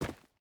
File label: footstep boots stones run; Rust